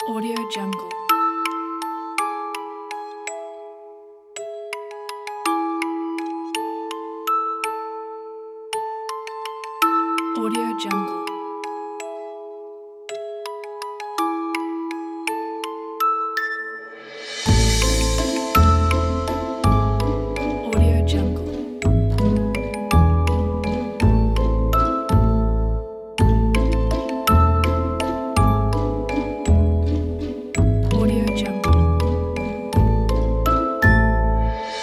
fairytales

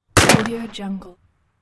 gun shot hitting metal

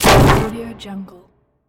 piano crash with wood debris

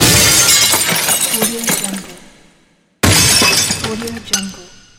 glass crash

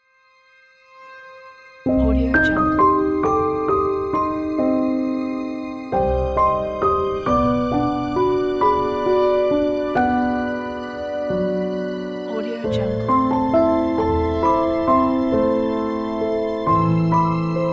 mystery game

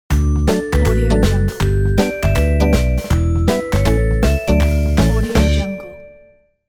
kids logo

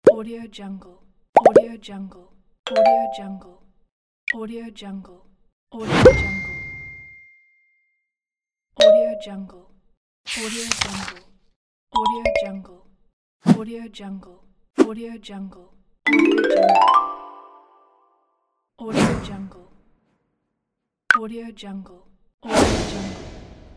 infographics video sound effects pack

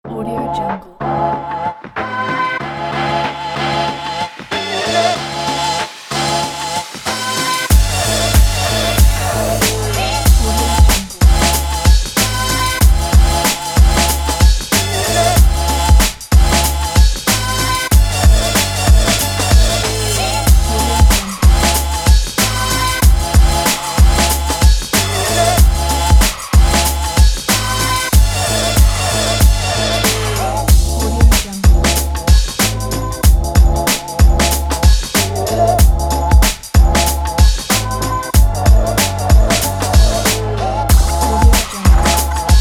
hiphop

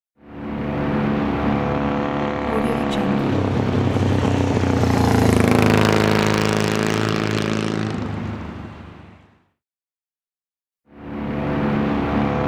biker motorcycle pass by

